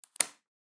Power Cord Plug

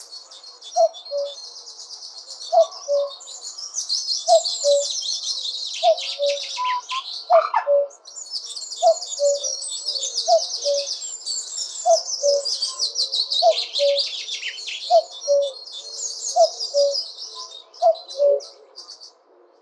Cuckoo Nature Reserve 6am; Tophill Low Nature Reserve

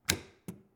Holga Shutter Release

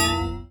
Rejected Feedback

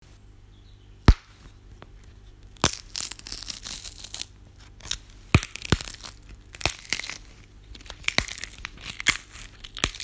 Celery Stick

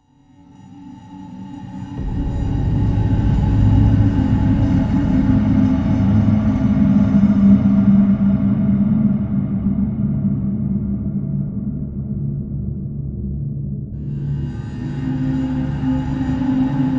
Creepy Ambience

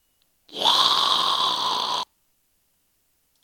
Monster Noise

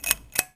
Light Switch

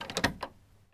Locking A Door

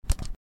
Button

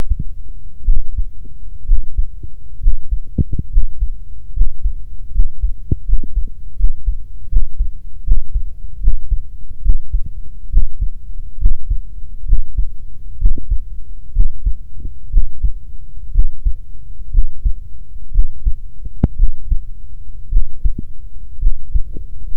Heartbeat

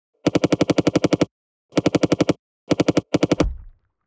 Cheap Silenced Rifle

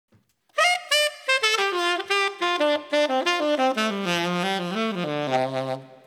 Top To Bottom 4 Beat Wait G Maj 12beat 90

